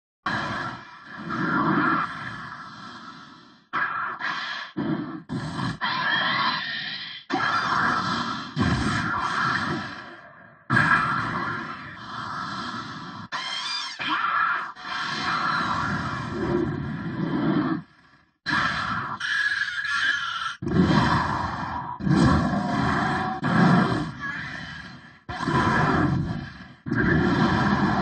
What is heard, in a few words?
tennis